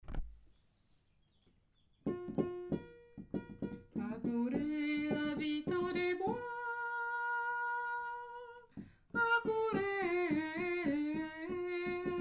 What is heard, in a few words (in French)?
choeur de chasse alto